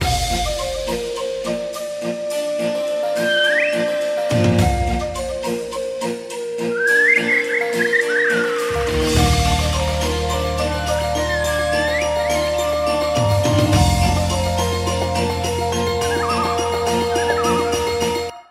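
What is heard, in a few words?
tune endangered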